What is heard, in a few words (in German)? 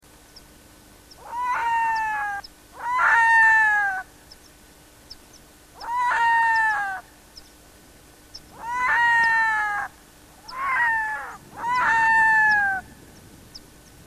sterntaucher